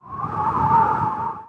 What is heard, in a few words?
wind; Counter-Strike Global Offensive